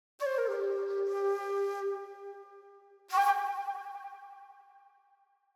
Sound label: Flute Short Light Flute Melody; Hollywood Edge; sound designer's toolkit v2